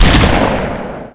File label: SPAS15; specops